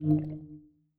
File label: focus change; Steam; tenfoot